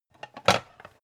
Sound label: pan stack hard